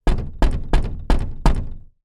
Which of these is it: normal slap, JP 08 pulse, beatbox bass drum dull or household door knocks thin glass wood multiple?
household door knocks thin glass wood multiple